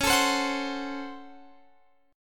Db Major 7th Suspended 4th Sharp 5th Db down Guitar Open F; strummed